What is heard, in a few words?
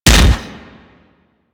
Impact Metal, Weapons; Warframe; Furax